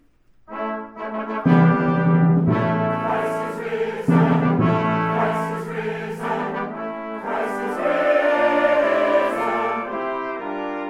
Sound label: Easter Fanfare